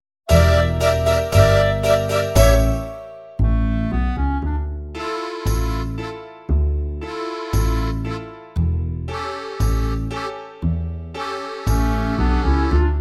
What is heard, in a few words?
gypsy fiddle backing track